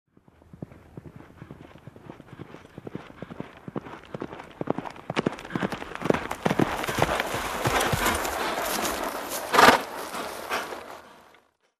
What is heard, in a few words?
Horse Gait on a sand surface incoming